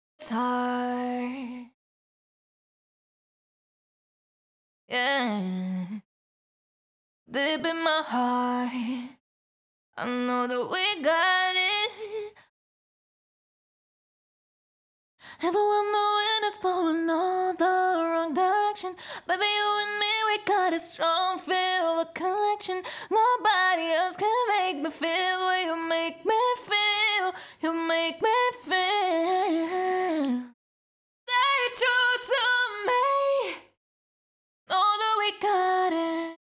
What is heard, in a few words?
CLEAN DUPL L (EMaj) BPM123, Pop Vocals, Acapellas; Ghosthack; Ultimate Pop Vocals; Rain